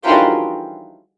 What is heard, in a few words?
Spell Scaring song; Skill Spell Scaringsong